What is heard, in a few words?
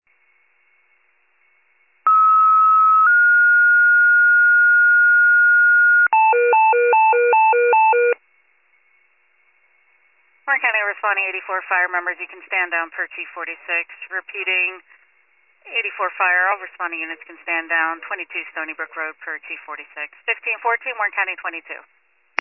fire, pager alert